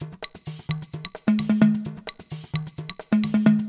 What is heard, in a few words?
Loop Percussion 130